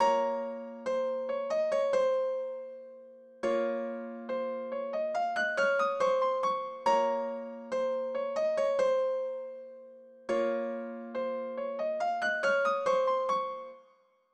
LOOP; All Star Kit

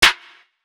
SNARE; Godbody Streetz Kit

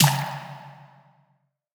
SNARE

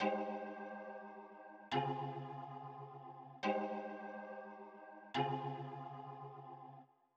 melody

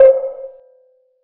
PERC

